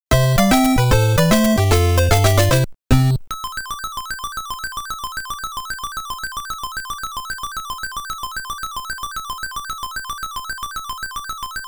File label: BATTLE CLEAR; Ganbare Goemon Sound Treasure Box